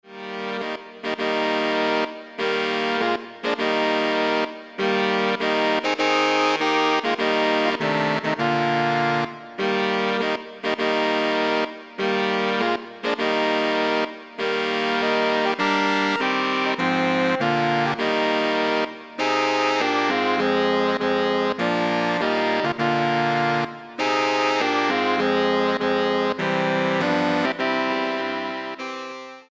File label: Saxophone Ensemble